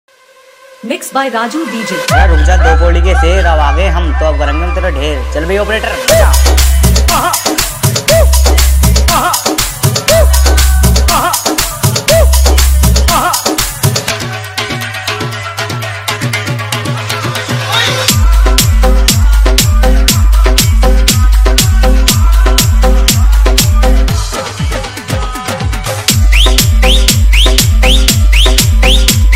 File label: Edm Vibration Remix